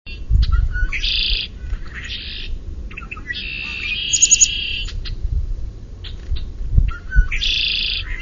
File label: blackbird with chickadee; red wing blackbird